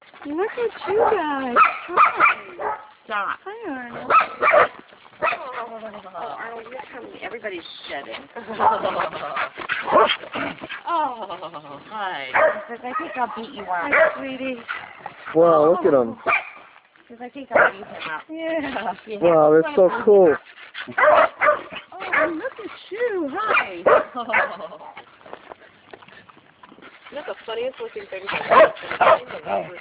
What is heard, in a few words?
corgis